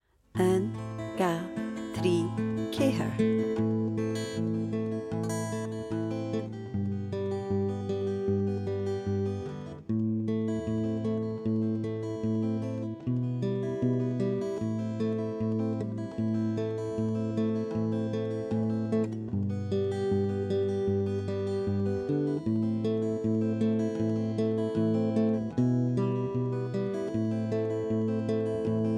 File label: Guitar only; Tha Sinn Dol Shireadh Mathann